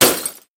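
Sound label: zvuki stekla, glass sounds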